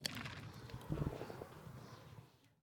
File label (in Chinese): 试炼刷怪笼环境声; ambient